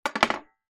industrial metal caulking gun put down on hard surface